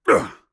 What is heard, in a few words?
Warrior Grunt Slow; Hi-Tek Trooper; Realm Royale